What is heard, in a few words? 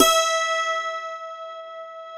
E5, DULCIMER; SoundBytes; Early Patches